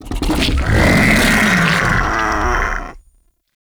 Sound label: Ancient Tank Attack, Enemies, Infested, Barks; Warframe